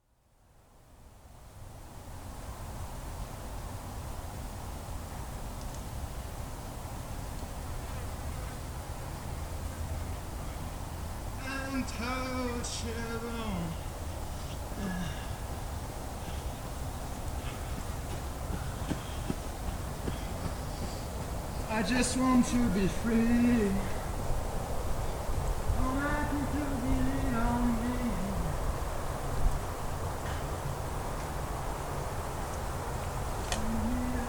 central park

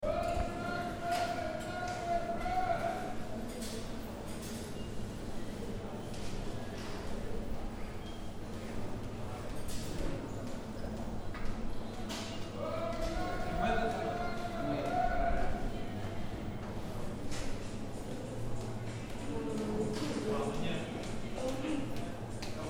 Lisbonne Metro Rossio; voix ville

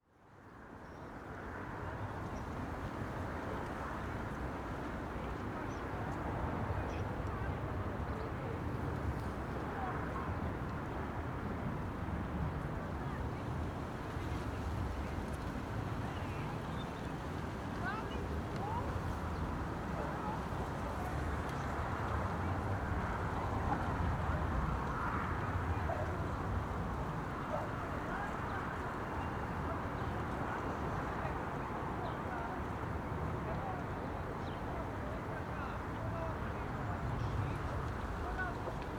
chelas

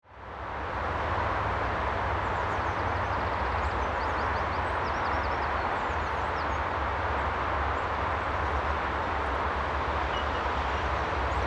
garches

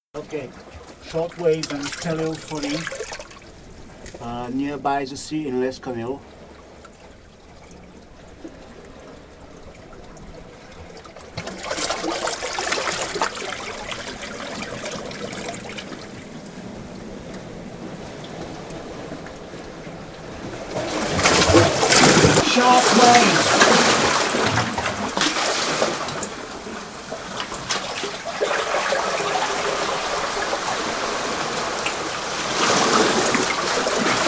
short waves; lesconil

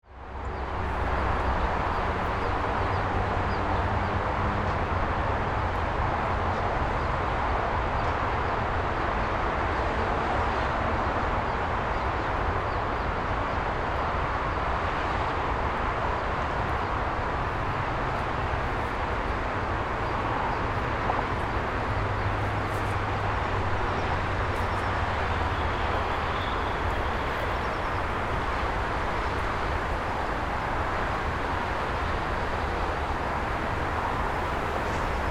allemany farm

